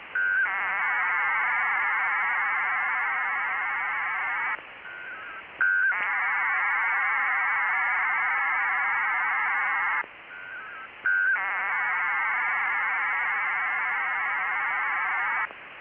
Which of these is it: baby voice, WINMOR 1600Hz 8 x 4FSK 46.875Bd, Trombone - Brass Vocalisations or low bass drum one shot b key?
WINMOR 1600Hz 8 x 4FSK 46.875Bd